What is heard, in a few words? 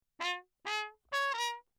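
trumpet mute Bach phrase; Perinétventiltrompete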